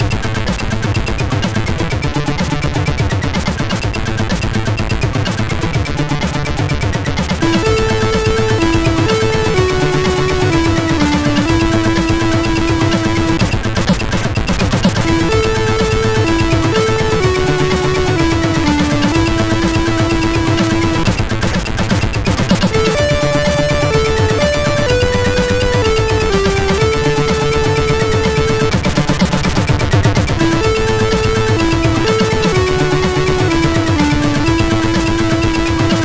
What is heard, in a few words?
sid MOS6581; MOS6581R3